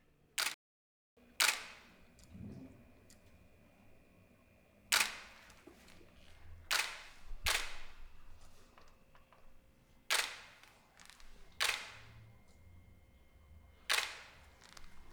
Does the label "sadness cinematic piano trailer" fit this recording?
No